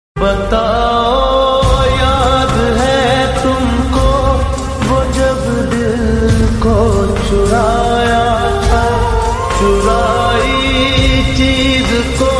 Breakup Ringtone

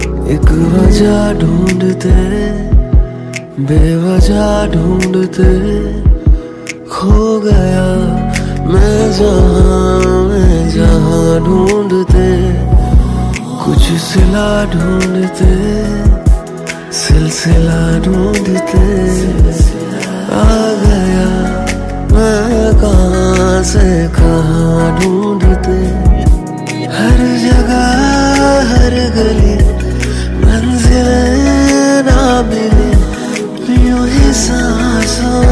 Hindi Romantic Ringtone

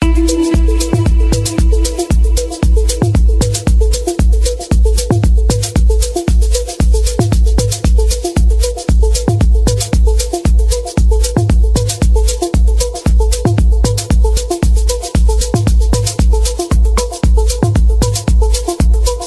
Belly Arabic Ringtone; ringtones

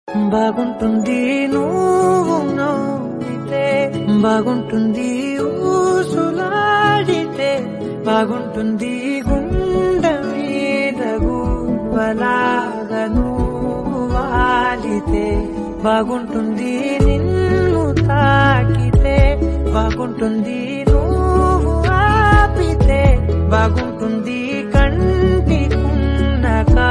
Tamil Ringtone

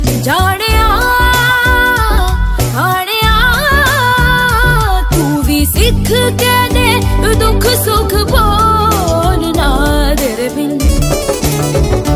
Old Hindi Ringtone

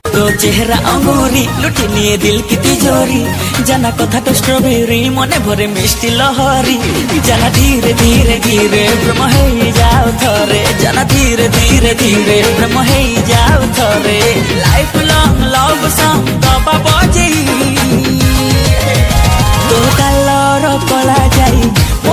Odia Song Ringtone

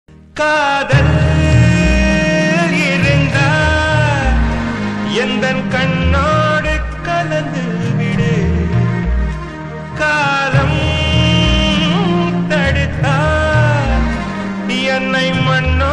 Tamil Ringtone

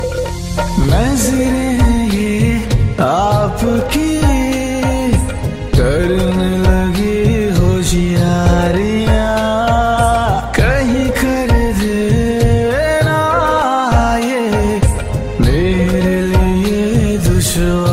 Romantic Hindi Song Ringtone; ringtones

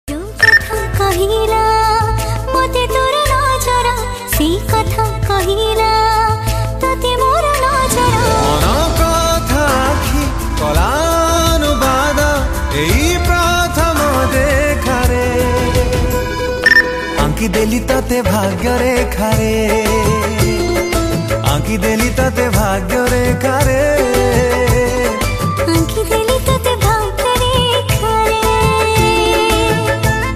Odia Ringtone

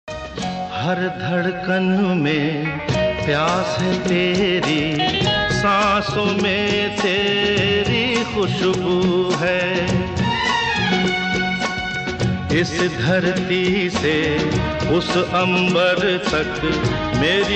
Love Old Ringtone

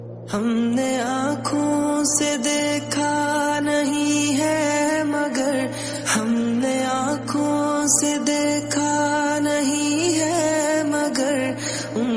Naat Ringtone

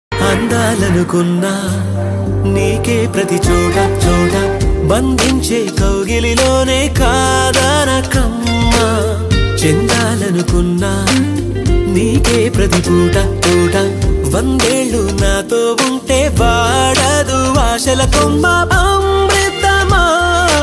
Romantic Telugu Song Ringtone; ringtones

